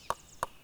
2x Claves, Percu Bois

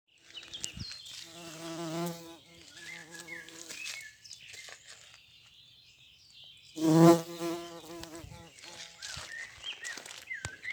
Bourdons

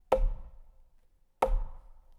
Baton buche Tom Haut x2 NUIT, Percu Bois

